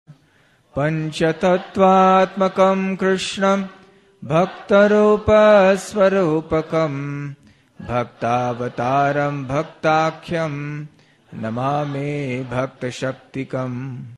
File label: Sri Panca-tattva Pranama Sung; Pranam Mantras